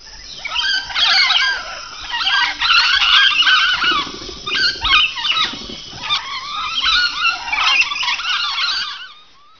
Bird; 特酷声音素材库

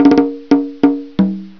Joy, happymusic; 特酷声音素材库; 背景音乐

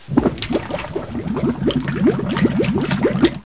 Water, 水声; 特酷声音素材库

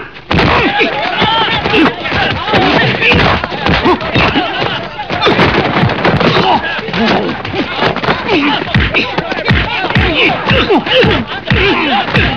Fight; 游戏音效; 打斗音效; 特酷声音素材库

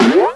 Cartoon; 卡通音效; 特酷声音素材库

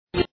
mouse over; FlashChat v3.931

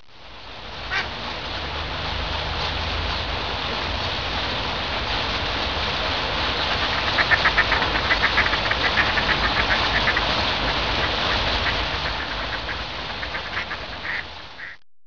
Rain, 雨声; 自然; 特酷声音素材库

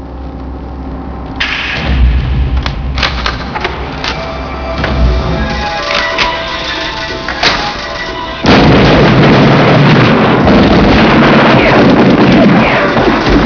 SHOOT, 射击; 战争; 特酷声音素材库

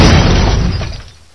Gen Hit L; 暴力赛车

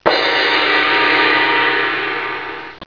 Joy, happymusic; 特酷声音素材库; 背景音乐

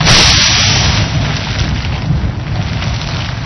Geyser; 暴力赛车

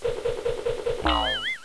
Fight; 特酷声音素材库

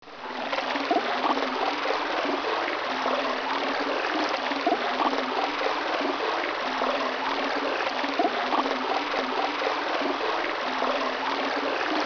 Water, 水声; 特酷声音素材库

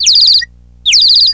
Bird; 特酷声音素材库

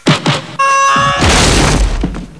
Fight; 游戏音效; 打斗音效; 特酷声音素材库

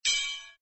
tin can; FlashChat v3.931

